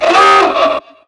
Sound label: old crazy car horn